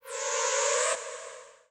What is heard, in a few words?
SPACE FX 140; SpaceSounds